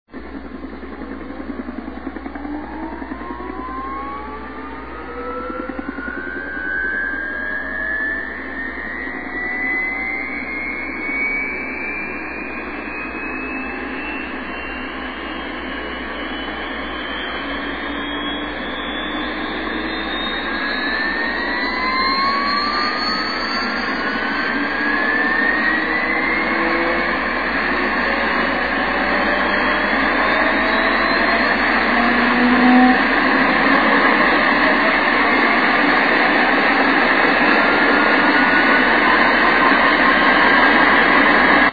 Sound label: Huey Startup